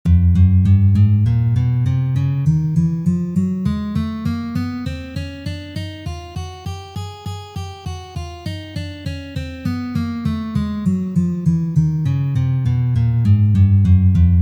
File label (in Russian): Uprazhneniya dlya gitary 1 2 3 4